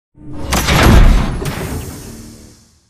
bt embark casual above right end; Titanfall 2

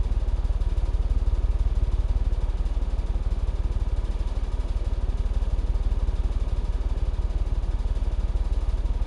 fan loop; Titanfall 2

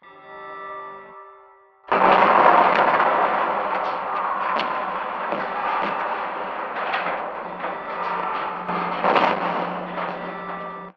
under fire; Titanfall 2

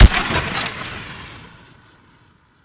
atk mech warble tail; Titanfall 2

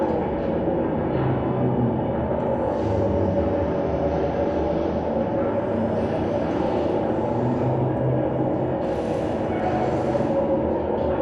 Robot Arm Track Med Dist; Boomtown; Titanfall 2

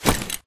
Grunt Mvmt FOL Loud Short; Titanfall 2

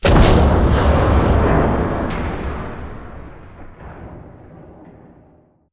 Explosion Rumble Exterior Close Texture; Boomtown; Titanfall 2

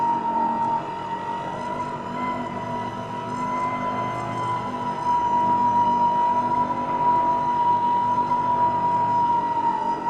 Prop Warehouse Prop Rack Move Close; Titanfall 2; Boomtown

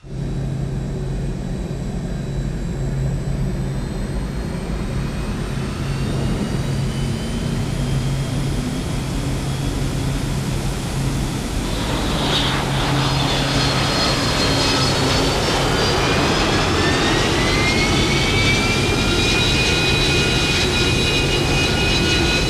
dropship; Titanfall 2

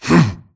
grunt hits; Titanfall 2

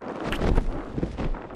flyer fly med dist; Titanfall 2

